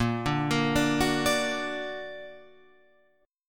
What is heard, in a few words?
Bb Augmented Bb down Guitar Open F; arpeggiated; JGuitar